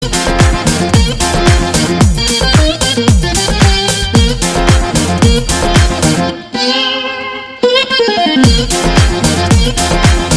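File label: background music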